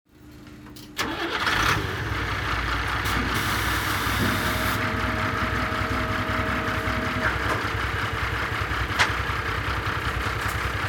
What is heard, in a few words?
truck unloaded by crane